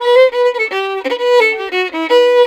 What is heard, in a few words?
VIOLIN, Celtic